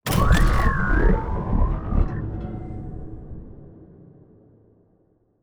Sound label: science ship move; Stellaris; shroud; ships